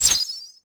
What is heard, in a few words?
grenade launcher whiz